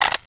tommy reload clip out; dod 1.3; dod enhancement pack

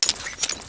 bazooka reload rocket in, weapons; dod 1.3; dod enhancement pack

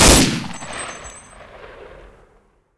garand shoot, weapons; dod 1.3; dod enhancement pack

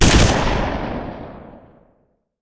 piat shoot; dod 1.3; dod enhancement pack

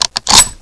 bolt back, weapons; dod 1.3; dod enhancement pack

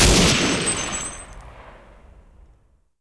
mg34 shoot; dod 1.3; dod enhancement pack

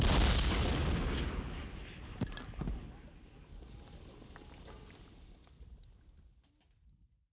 mortar hit, weapons; dod 1.3; dod enhancement pack

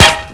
helmet hit; player; dod 1.3; dod enhancement pack

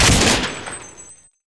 thompson shoot, weapons; dod 1.3; dod enhancement pack

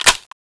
tommy reload clip in, weapons; dod 1.3; dod enhancement pack

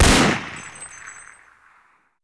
sten shoot, weapons; dod 1.3; dod enhancement pack

